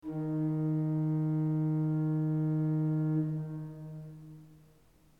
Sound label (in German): ton, orgel